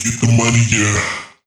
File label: phrase, Vocal; Slate Digital Omnivox Vocal Sample Pack